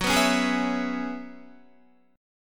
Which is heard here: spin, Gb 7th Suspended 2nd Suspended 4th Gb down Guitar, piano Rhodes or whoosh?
Gb 7th Suspended 2nd Suspended 4th Gb down Guitar